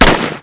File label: m16 single; svencoop